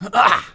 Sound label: choke